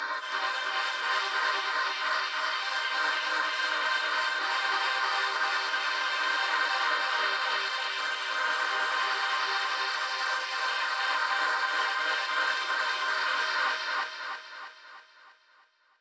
pad

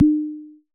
Percs, Elektron MachineDrum SPS1 MKII

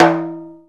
CNTR STRK, Timbale; Timbale Battery

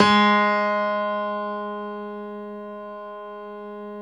Stereo Grand, Keyboards

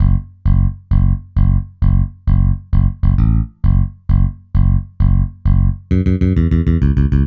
Roundwound Bass; Dr. Fink's Funk Factory

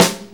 Snare, Snare Drum; One Shots; Drum Tools

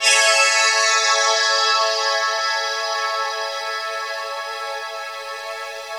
Dream Pad (Maj)

